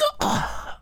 Player Hit; 1916 der unbekannte krieg gamerip

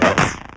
perc

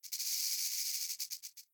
Rattlesnake; The Town; 3D Environment

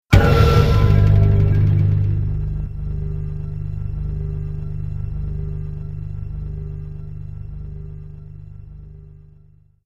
Living Forest Round Over, Goro's Lair Round Over; Mortal Kombat II; arcade 1993

